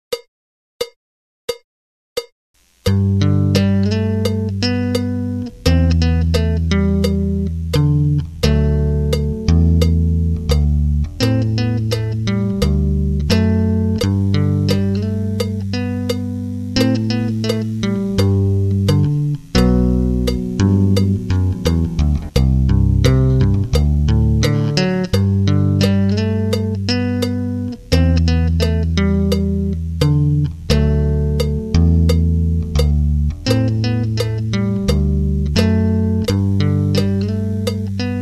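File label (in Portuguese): two hands tapping musica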